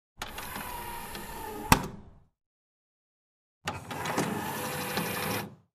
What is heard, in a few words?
Player Cassette Motor